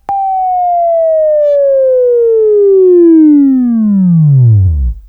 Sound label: Buzz; Labview Program; Sweeping Training program